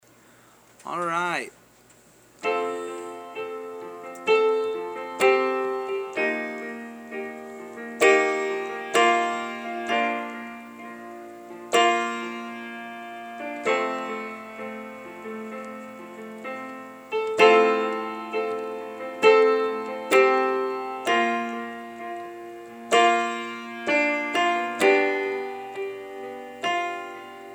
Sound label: Melody